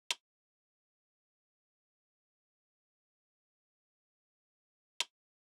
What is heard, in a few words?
Drum Loop 98 BPM Percussion; Loop Stems; Cymatics; Vibes II Drum Loop Collection